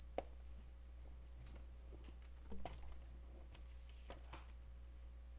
toy

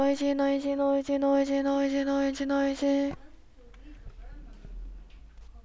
noisy

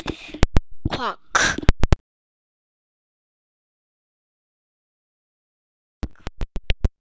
quack

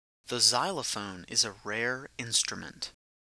xylophone

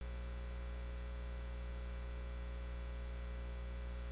wheeze

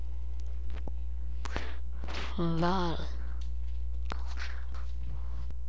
bottle

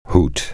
hoot

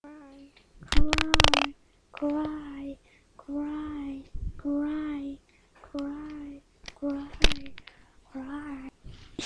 cry

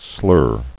slur

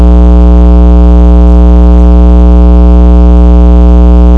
pop

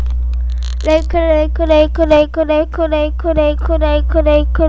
rake

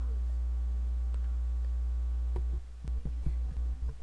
brook

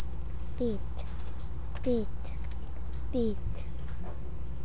beat

